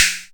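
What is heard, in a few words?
Dry Snare, dry perc; Quasimidi Rave-o-lution 309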